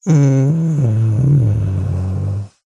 human male light moan groan quiet ill weak pain; zapsplat

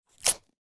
office sticky tape remove from surface

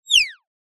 cartoon whizz past fast

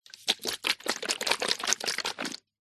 foley liquid in plastic disc container shake

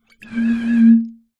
foley bottle wine blow air top liquid inside

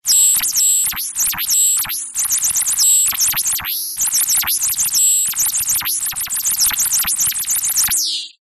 science fiction data transmition receive or send; zapsplat

